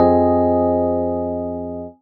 stab keys, stabs

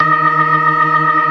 ORGAN ALL STOPS; Keith Emerson Signature Series

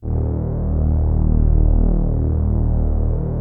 Prophet 5 D#2; Hollywood Edge - Giorgio Moroder Rare Synthesizer Collection

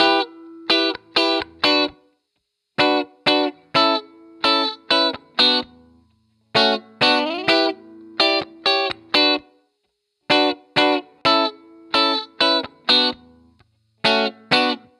rhythm Emin Bpm128, 70s Guitars; Fox Samples; Back to the 70s Guitars

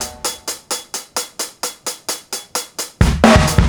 roll, drums acoustic, 130bpm, metal drum; musicradar-metal-drum-samples

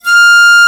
Piccolo p F4; Digital Sound Factory Virtuoso

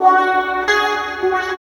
GTR; East West - Phat & Phunky CD2; 90's Sample CDs

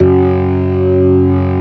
STOPS, ORGAN; Keith Emerson Signature Series

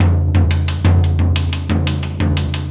Tom Particle, Processed Drumkits

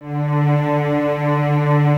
cellos D 2, String Ensembles; Miroslav Vitous

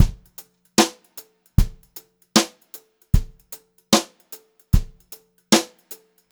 brushed drum loops, beat; USB Soundscan vol.39; AKAI; 76 HOTROD

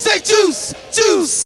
VOX; Zero G; Man Machine

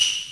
Title Screen loop; Ganbare Goemon 2 - Kiteretsu Shogun Magginesu!

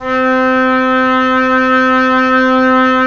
WND OB P, Oboe p; Roland - LA Composer vol. 4

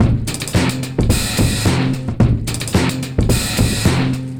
HIT THE BREAK, 109 bpm; POTBreaks

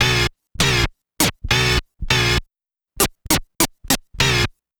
Scratch, Trance

